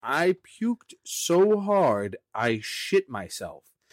puked so hard

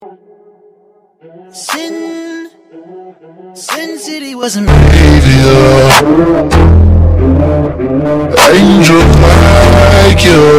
bass; sin city

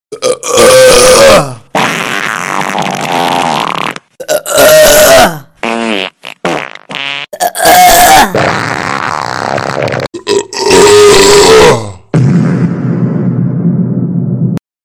eaaagh

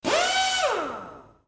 drill auto air wrench

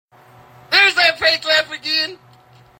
fake laugh

